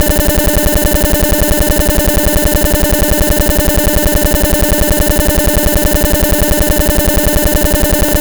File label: photodiode capture